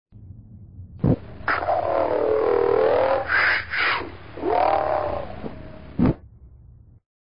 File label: win; vapesquared